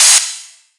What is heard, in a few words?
DEATH HAT, Closed Hats